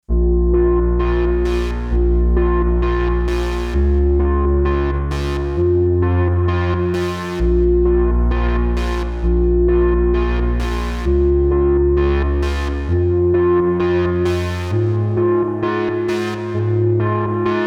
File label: SSL X-Logic Alpha Channel Synth EQ Limiter strong